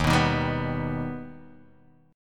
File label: D sharp Augmented D sharp, chord